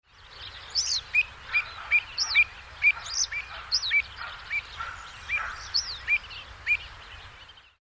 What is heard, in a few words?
avocet